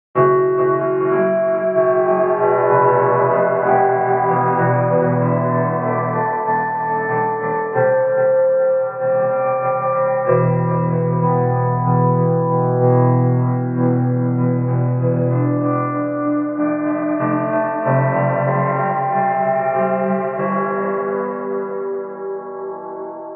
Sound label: delay drones digital decay wet; aas; objeq delay